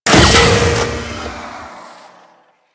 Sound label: Shot 3D; Grn Ogris